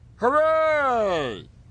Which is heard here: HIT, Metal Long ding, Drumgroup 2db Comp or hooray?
hooray